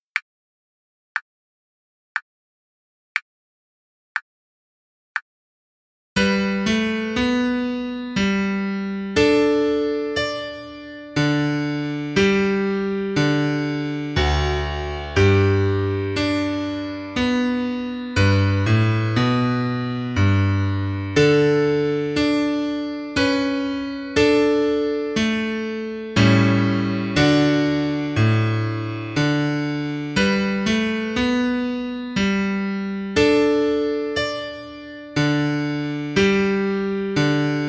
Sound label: minuetto piano; marais; violon piano